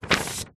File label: foley paper sheet a4 run through hand; zapsplat